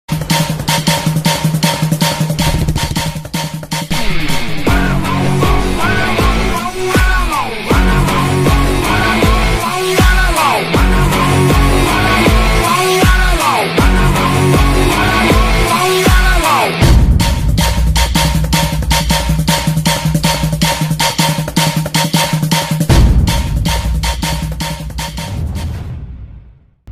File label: bgm ringtone, tamil; adithya varma